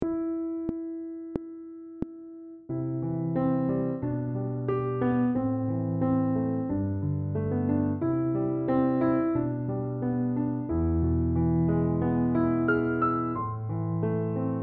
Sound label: Piano Only; Les 1 Oefening 20